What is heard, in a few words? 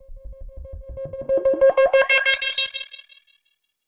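alarm siren, filter processed, 170bpm; musicradar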